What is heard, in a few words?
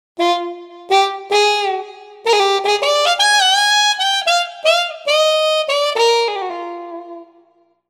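cup mute trumpet; TURBO VL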